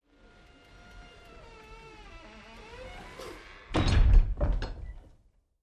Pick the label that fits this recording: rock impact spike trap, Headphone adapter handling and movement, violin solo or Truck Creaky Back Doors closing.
Truck Creaky Back Doors closing